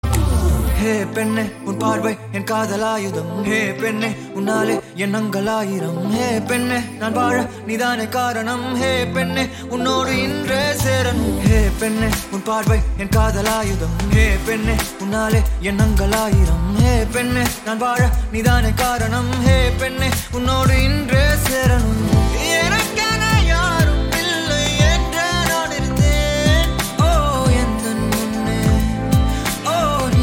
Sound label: Ringtone